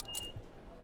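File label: Tokyo Subway Pasmo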